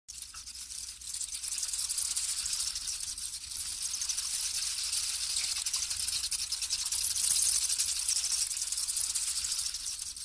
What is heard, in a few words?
Long tailed chinchilla; چینچیلای دم دراز